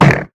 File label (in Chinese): 受伤声; 潜影贝